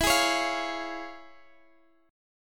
F Minor Major 7th Sharp 5th, E

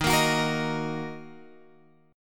D sharp Minor, Guitar, Open F; strummed

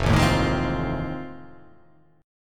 F 7th Sharp 9th

